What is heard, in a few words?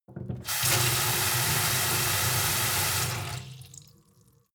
sink fill with water hard; industrie-wirtschaft; gastronomie